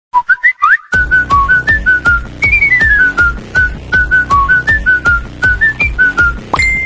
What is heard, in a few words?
whatsapp ugh